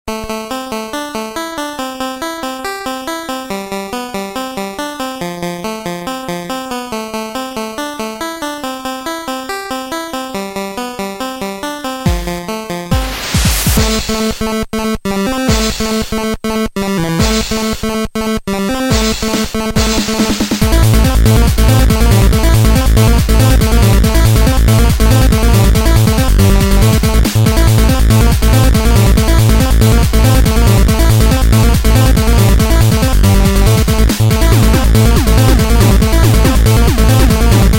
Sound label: 8 Bit; Mortal Kombat